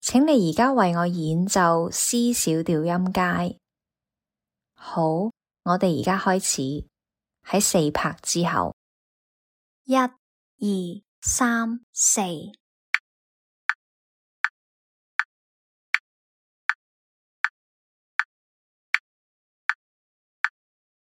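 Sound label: C minor scale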